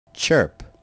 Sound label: chirp